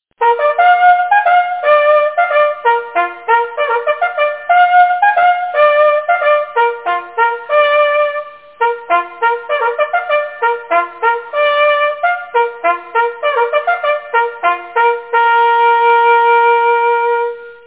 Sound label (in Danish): vaekning, jagthorn